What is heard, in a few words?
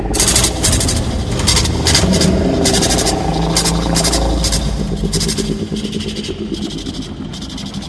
smoke